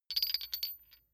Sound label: small metal handling jingle single; industrie wirtschaft; metall